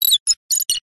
science fiction computer beeps high pitched response